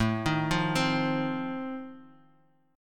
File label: F 7th A